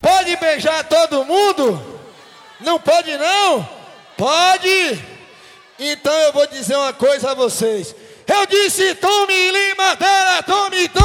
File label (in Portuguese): samba